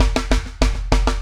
SHRED, Backbeat

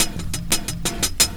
Hat 175, Drum&Bass Perc Loops; EZRollers

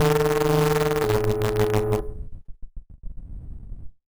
random synth failure, malfunctioning synth

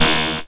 blips, opl3

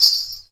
METAL, Afro Shakers Ankle Pods; Roland LCDP14 Africa VOL-2

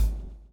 BLOW; Spectrasonics - Backbeat

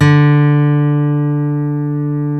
STEEL D, FOLK STEEL Guitars; Spectrasonics; Hans Zimmer Guitars VOL-1

